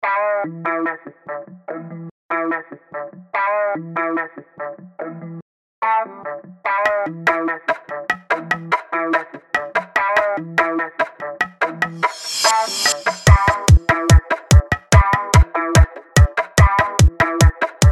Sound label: funkstep